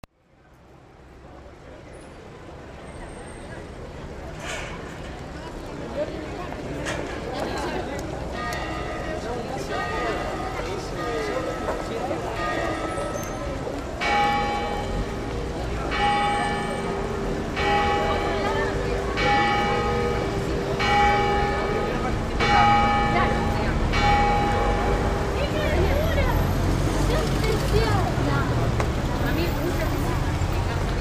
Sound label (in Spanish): iglesia campanas; santa fe